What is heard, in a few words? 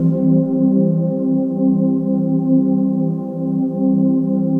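trance pad; Best Service; XX Large - Pads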